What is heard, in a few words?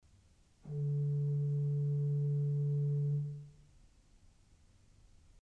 Principal 4' D, orgel pipes